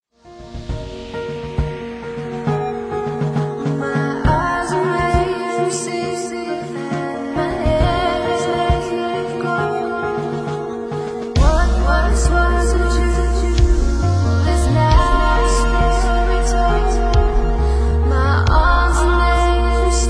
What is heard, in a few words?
Superb Sound